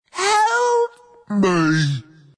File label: death; dota; ogre magi